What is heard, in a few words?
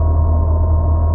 hum